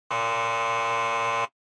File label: Electromagnetic Buzzer, Dry